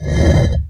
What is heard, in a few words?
grinding stone; dfcaverns; underworld items